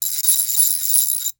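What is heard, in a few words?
Ratchet; 1001 Sound Effects; Tools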